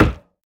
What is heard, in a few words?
Obstacle Collision Player; Backrooms 2D